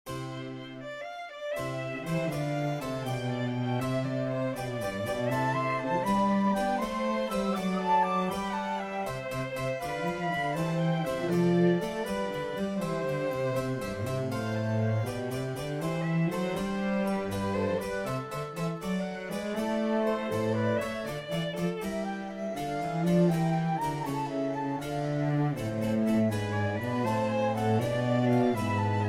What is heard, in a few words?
Trio sonate C Allegro